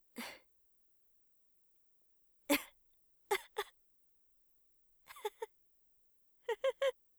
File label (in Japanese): 唖然; 効果音なし